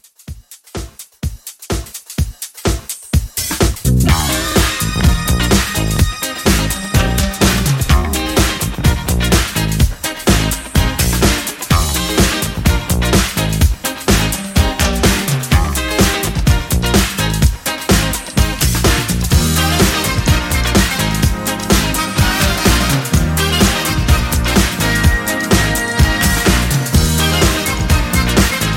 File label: 80s Re-Drum 126